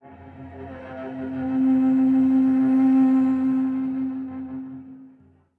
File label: Cello Octave Flutters; SoundsCrate